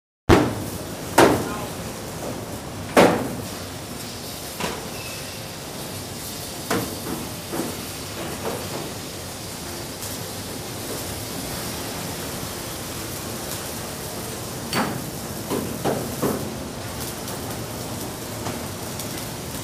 fonovye zvuki zavoda

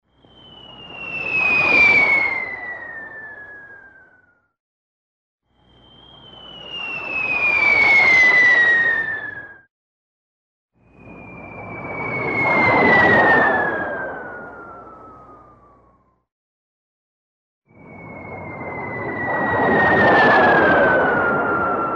svist padajuschego snarjada

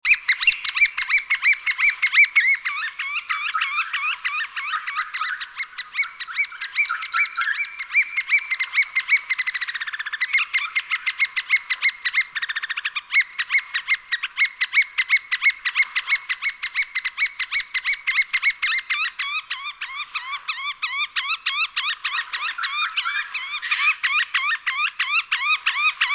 golos kulika soroki

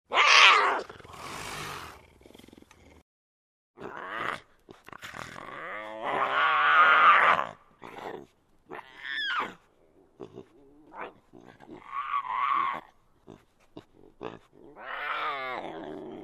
detenysh jaguara

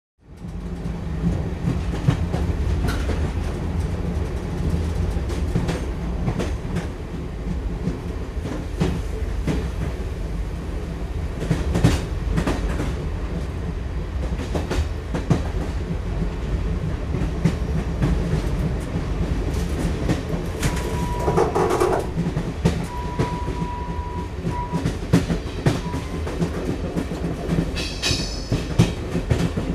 zvuk koles poezda

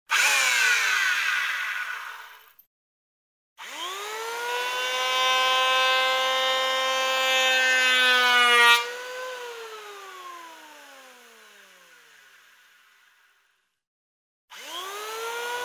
stroganie na strojke

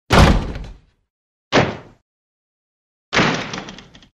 gromkij hlopok dverju

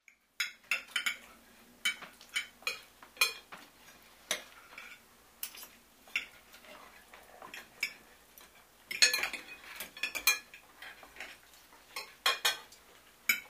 zvuki priborov za stolom

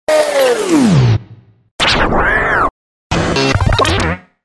skretch peremotka

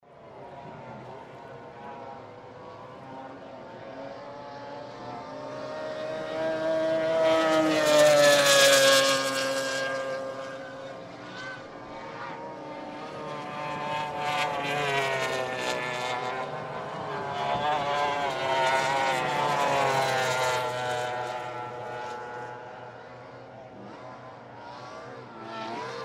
gonki motornyh lodok

